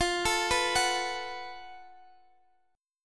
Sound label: Gb Minor Major 7th Double Flat 5th F